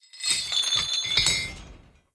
Chain; blue archive

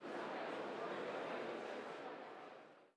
Crowd; blue archive

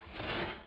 Slide Open; blue archive

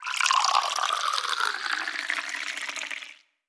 Cup; blue archive

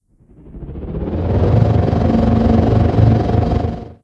Drone On; blue archive

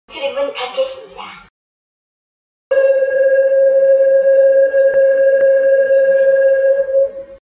close door; korail; Train Announce

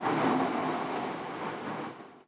Subway; blue archive

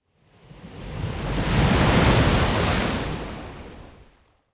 Sea Wave; blue archive

